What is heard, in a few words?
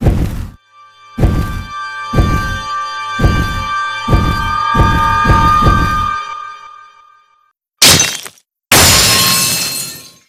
break; amara